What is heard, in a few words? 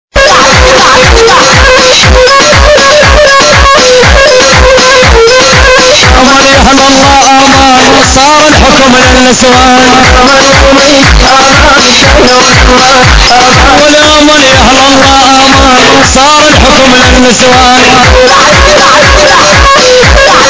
earrape turkish song